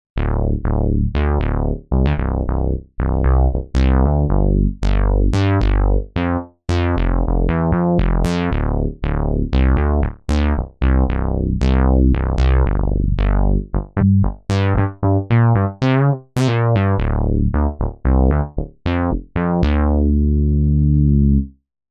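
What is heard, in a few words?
Bass Standard, synth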